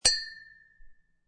Wine Glass Clink Two Wine Glasses Clink Together In A Toast Cheers; Glass Sound Effects; Lynne Music